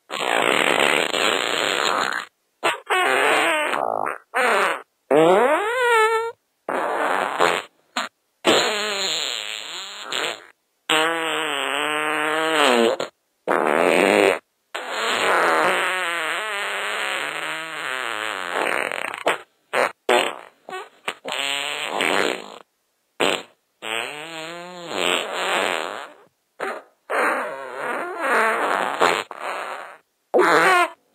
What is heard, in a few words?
Funny Fart Ringtone